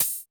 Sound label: Mega Drums, Korg 01W